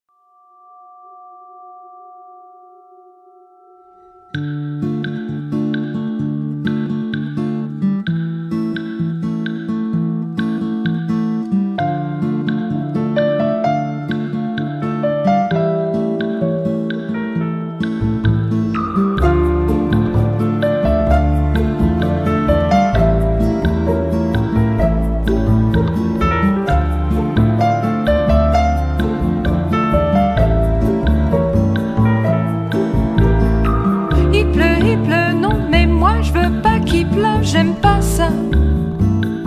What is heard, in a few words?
Rhumba Of The Rain